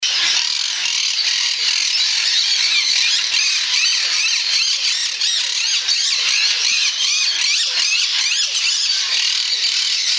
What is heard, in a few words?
Quaker Parakeet, Parrot Calls; Pyrruha Conures (green cheeked)